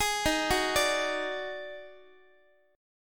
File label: Eb Minor Major 7th Double Flat 5th, Guitar; JGuitar